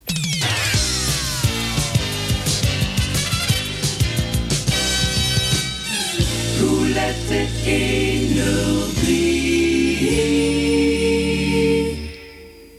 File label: silence